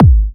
Trance Kicks, Bassdrums

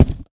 Box, household rhythm samples Hits

